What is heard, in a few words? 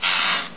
creak; de arizona